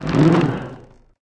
tree boss damage, monster

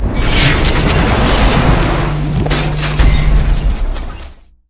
robot boss, monster

